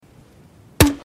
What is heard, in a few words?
m79 grenade launcher